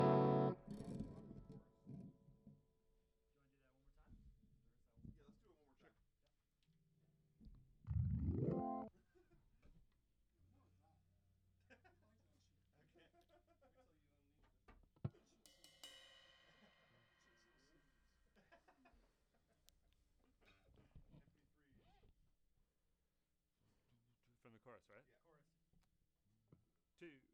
Rough Mix L

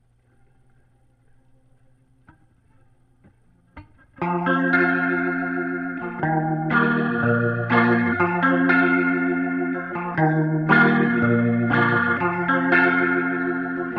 Leslie gtr

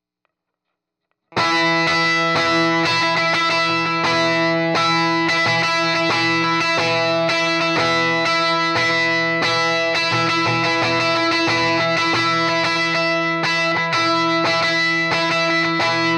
Heavy GTR

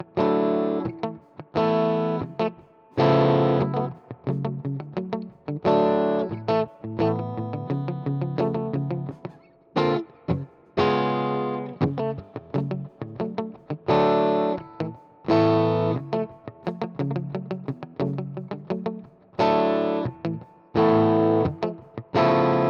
GTR R84

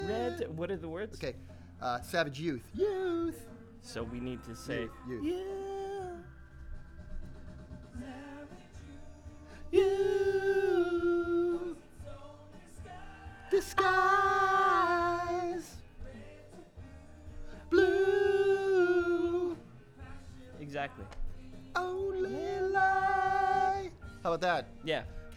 VOX Back

